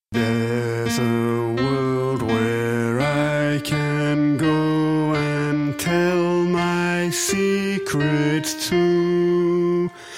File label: alto; pop